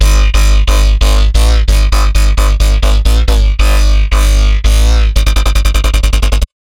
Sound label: Bassline C3 140, Dubstep; GhostHack